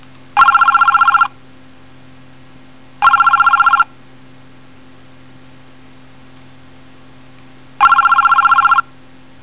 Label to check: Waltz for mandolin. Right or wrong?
Wrong